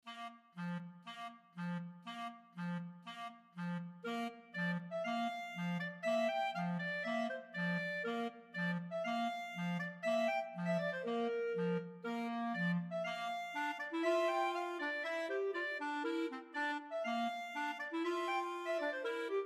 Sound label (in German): divertimento 2 klarinetten